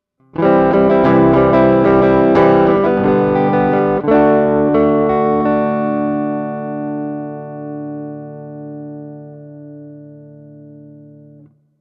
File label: Crunch, guitar